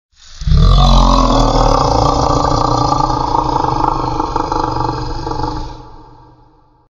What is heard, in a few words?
Cartoon monster; هیولا کارتونی